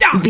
voice male hurt pain

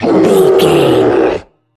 troll monster battle grunt

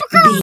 chicken bwak

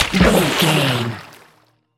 water splash object body

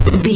retro impact hit general

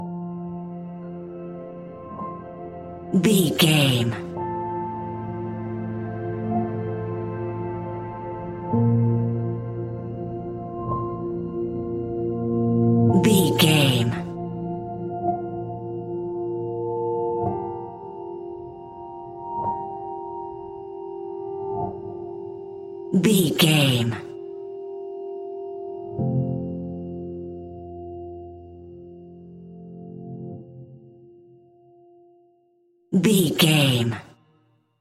piano, A relaxed and chilling piece of music; great for light documentaries, background music for tv shows

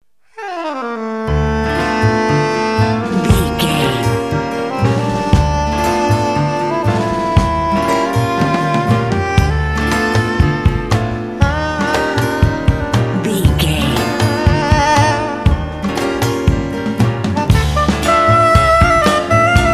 jazz afro

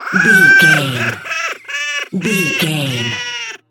goblin fairy laugh intense

